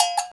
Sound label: cowbell